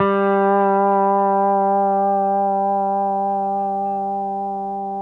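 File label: pedal steel G3 right; PEDSTL NOTES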